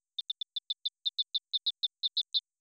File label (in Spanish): Canto tipo 2